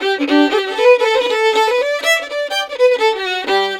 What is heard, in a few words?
120 D violin, Celtic Flavours